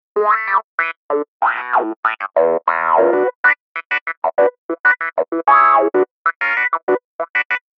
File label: Wah Clav